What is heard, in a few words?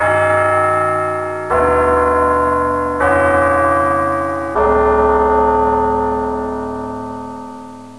bell 15 mins; brainbread; cemetary